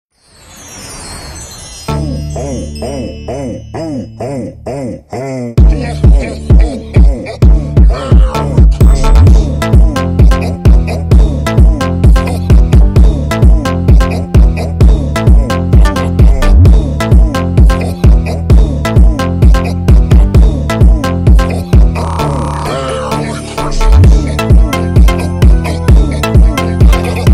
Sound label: christmas funk natal funk brazilian funk